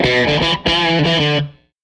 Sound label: Tele Guitar Loop